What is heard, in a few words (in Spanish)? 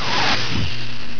drill; Enciclopedia Del Perro